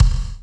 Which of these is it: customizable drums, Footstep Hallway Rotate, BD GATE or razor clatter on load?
BD GATE